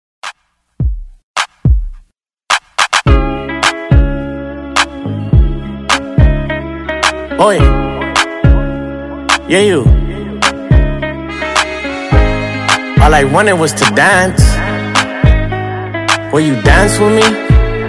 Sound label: Hiphop Redrum